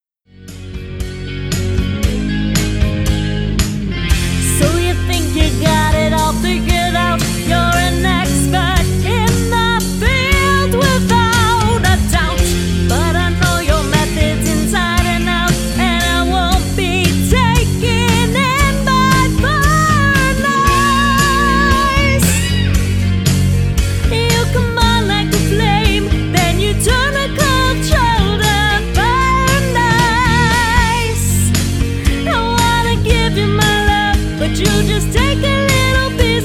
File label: singing